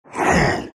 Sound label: shards pain; terrorblade